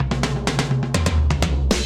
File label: Tom Fill 128bpm, Session Drums Studio; OldSchool; Ableton; Factory Packs